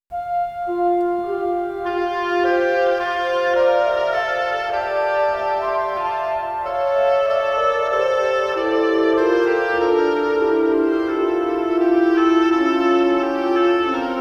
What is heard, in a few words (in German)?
Variationen - Oboe; Variationen über Der Mond ist aufgegangen